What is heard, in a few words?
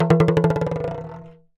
Talking Drum Bounce; Percussion Samples